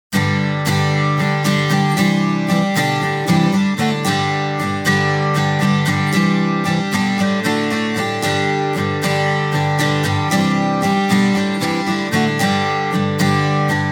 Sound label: Strumming